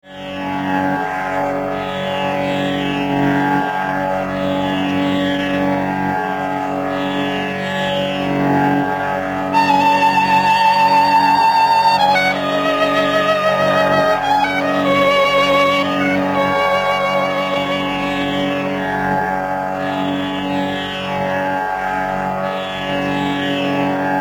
All India Radio IS

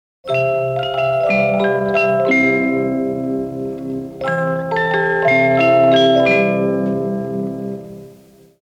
Swiss Radio International IS

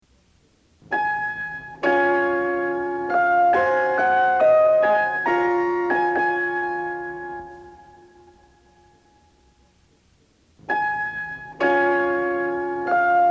Interval Signal; Deutsche Welle

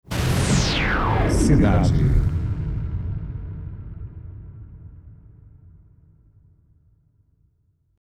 white noise; Cidade FM

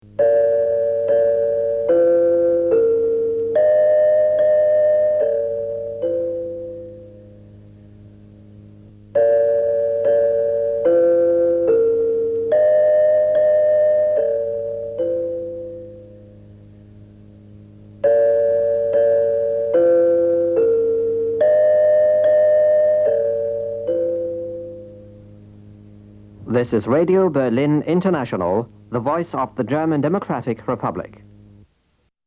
Radio Berlin Interval Signal

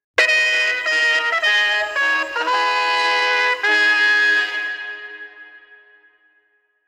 Radio Tirana IS

